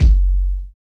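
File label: dirtbag kick; Drums In Your FACE!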